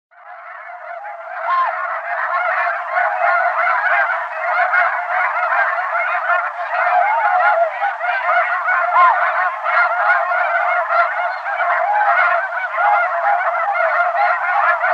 Snow Geese

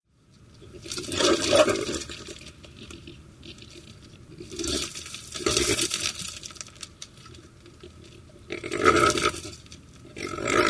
Javelinas feeding; ORION

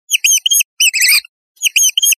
Field Rat; Rodents

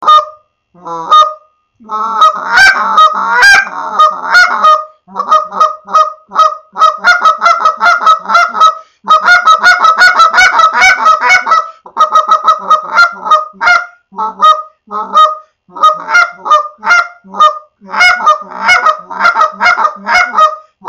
Canada Goose Clucks & Double Clucks

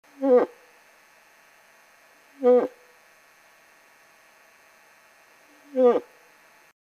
Old Bull In Love, Moose

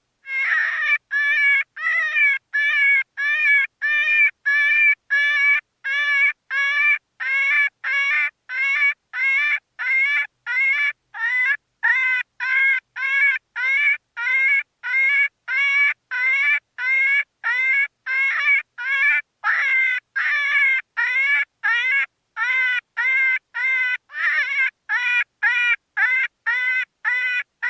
Wild rabbit distress call with death cries

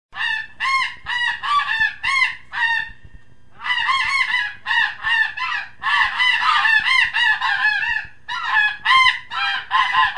Snow Goose Modified

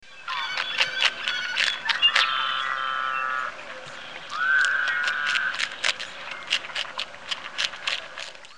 Beaver eating in wild

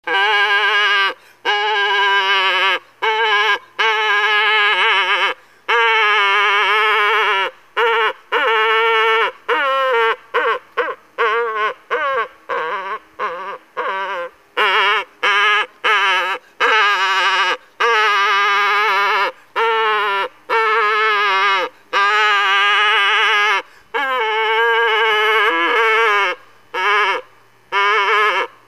Cow Moose In Distress; Dave Kelso

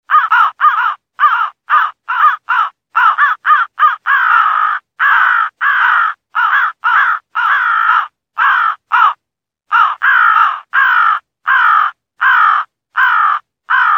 Crow help call

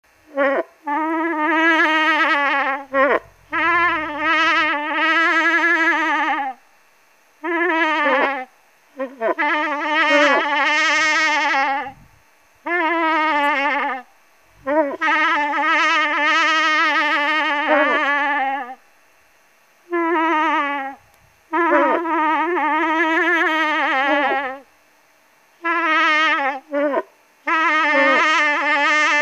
Cow Harrassed by Young Bull, Moose; Dave Kelso

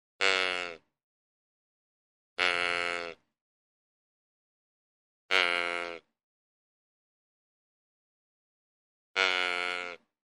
Buck Tending Grunt, Deer

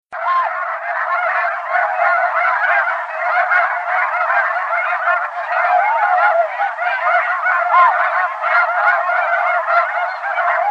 Snow Goose Flock Large Modified; ORION

